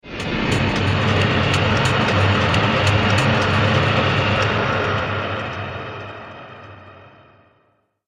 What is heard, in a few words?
Industrial Noise Transition; Transition Sound Effects